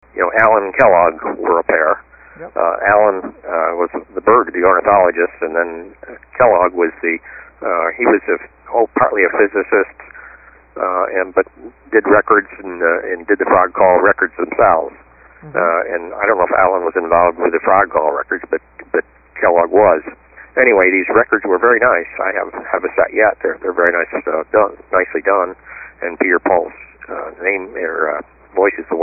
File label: Imitating Frog Calls at Party